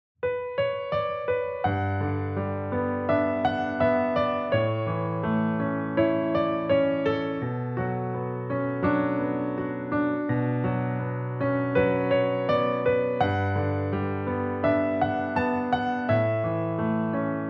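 lyrical romantic calming narration film background sounding piano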